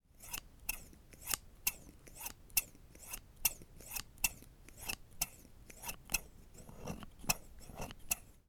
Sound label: Scissors